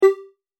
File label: SE sys pop cursor